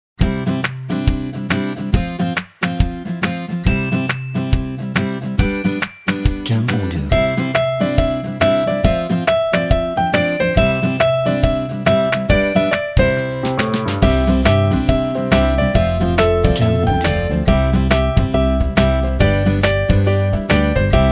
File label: Positive Acoustic Folk